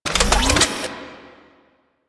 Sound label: Charge Up, Weapons; Warframe; Archwing; Larkspur Prime